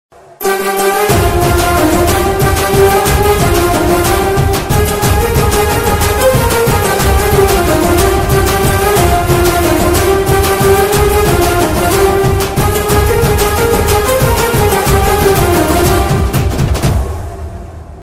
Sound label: melody bgm ringtone; kiran abbavaram